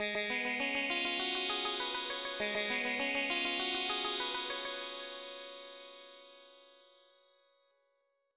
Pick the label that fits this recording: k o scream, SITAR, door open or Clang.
SITAR